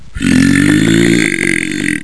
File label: burp